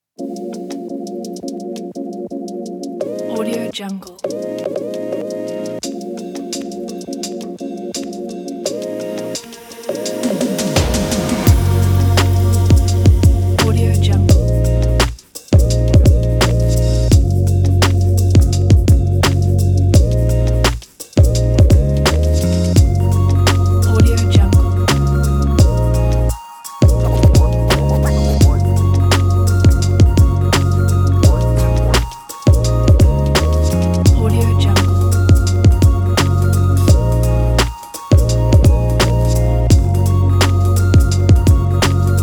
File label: hiphop vlog